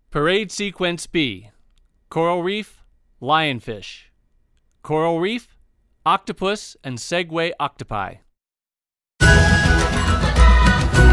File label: Music; THEME-PARK; Ocean-Kingdom; PARADE MUSIC